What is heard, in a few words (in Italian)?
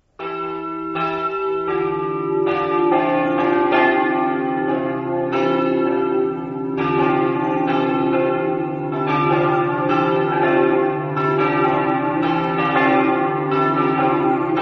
campane; pasian